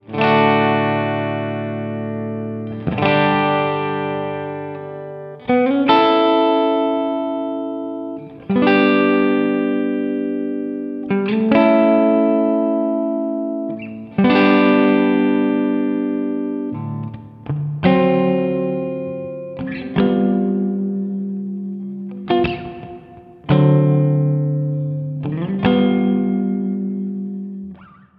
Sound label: Jazz Guitar Dry